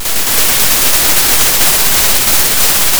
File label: pop, Sound of a thunderstorm